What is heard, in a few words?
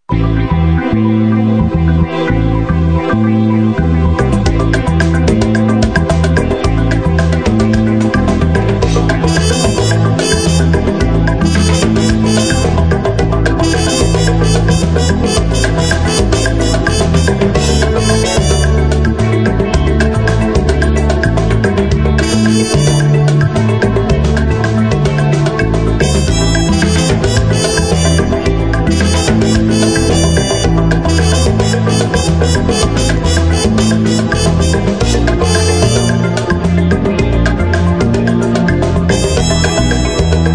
Samba Taxi Latina